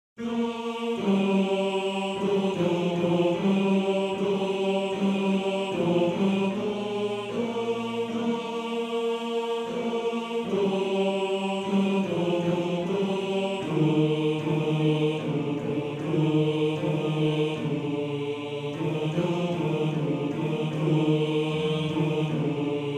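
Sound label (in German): Tenor 2, Männerchor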